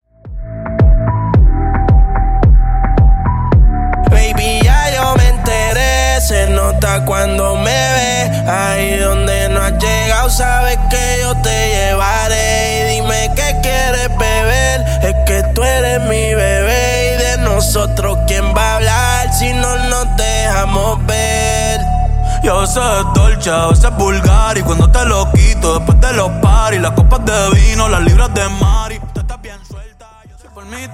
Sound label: Transition 110 123BPM